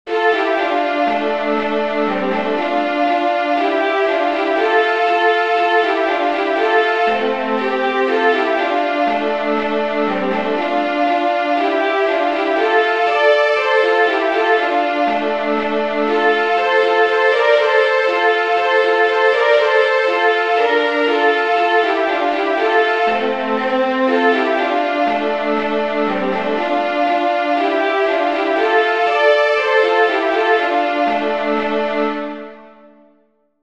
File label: THE PARTING GLASS IN D MINOR, fiddlers; southern kings fiddlers